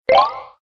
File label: boost; HeroRush